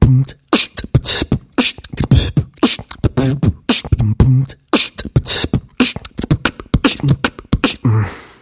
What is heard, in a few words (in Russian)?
zvuk bitboksa